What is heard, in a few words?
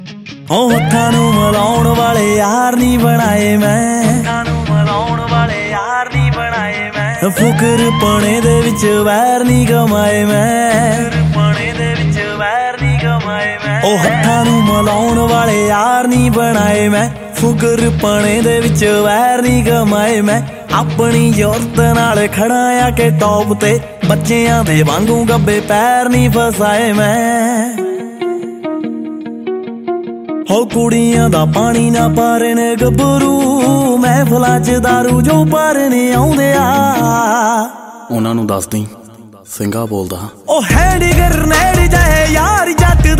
New Punjabi Song Ringtone